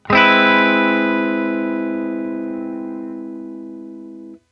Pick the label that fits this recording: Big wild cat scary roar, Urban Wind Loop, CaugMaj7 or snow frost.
CaugMaj7